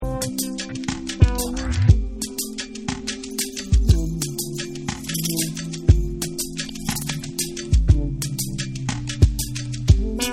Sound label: Afrosoul Instrumental